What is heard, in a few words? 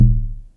SYNCUSSION